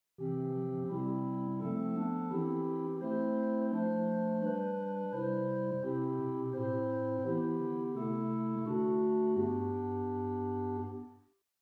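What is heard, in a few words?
Swell Rohr Flote 8, organ stops; Hauptwerk; St Annes Moseley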